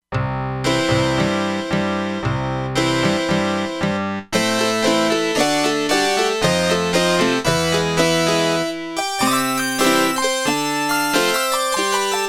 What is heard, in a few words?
Lurch Pluck; Factory Presets; E-mu Proteus-2